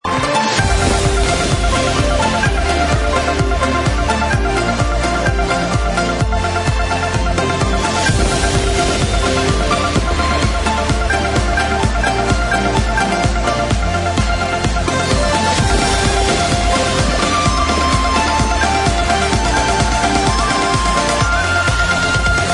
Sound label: big Win; Helloween; slottiger